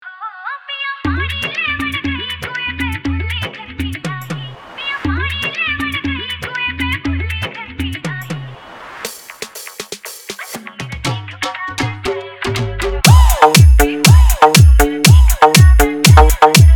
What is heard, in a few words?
Full Dance Remix Mix